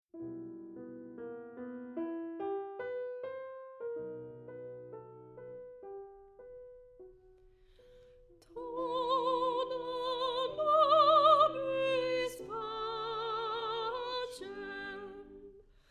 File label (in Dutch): Sopraan, Agnus Dei; Rossini; oefen CD; Versterkt